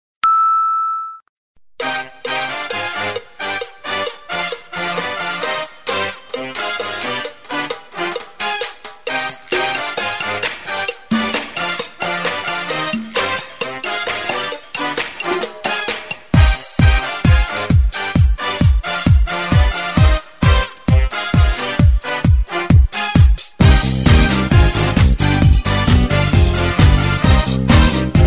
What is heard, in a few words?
Loud loud